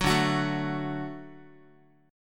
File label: D Major D down Guitar